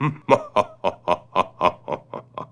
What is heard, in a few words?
talkie box; hunter